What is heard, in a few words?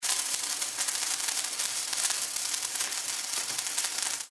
firework